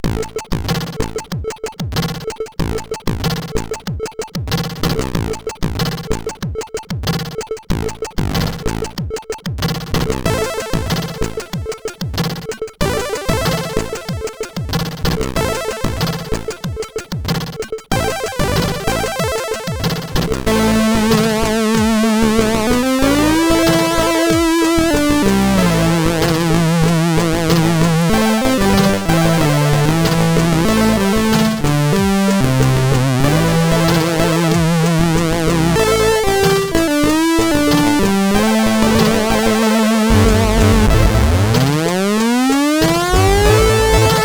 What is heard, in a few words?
Beat, sid CSG8580R5